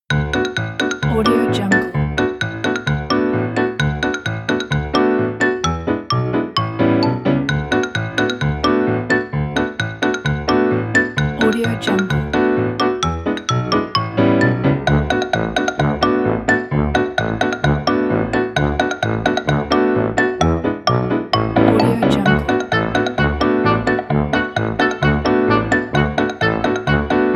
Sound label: cartoon